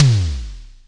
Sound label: Simmons SD-5 drum machine